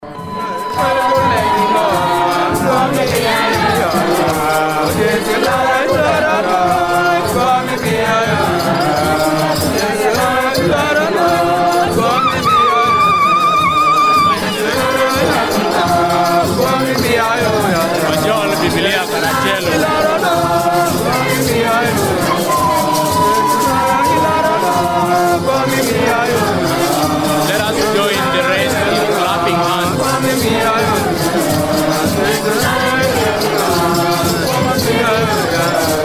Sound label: Catholic Diocese Choir; Nebbi